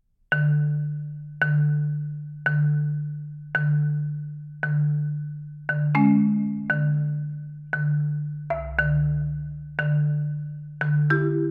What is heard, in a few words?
for solo marimba; devouring time